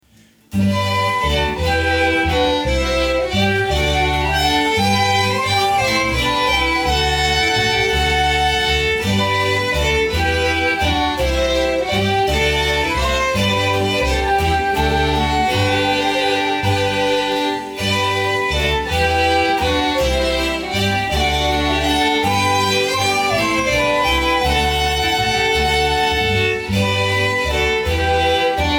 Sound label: galliard; Xmas CD; Frog Galliard #2